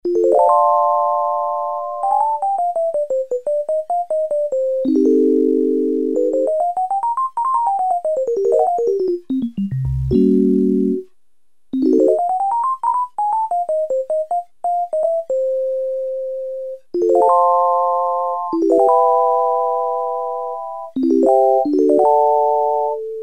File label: ELEC PIANO, Casio CZ-1000; Preset